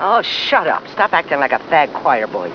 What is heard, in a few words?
choirboy